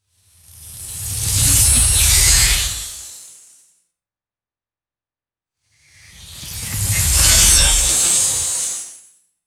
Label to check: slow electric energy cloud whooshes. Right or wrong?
Right